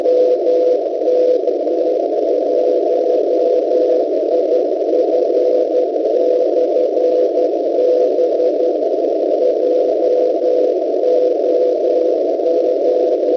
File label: cw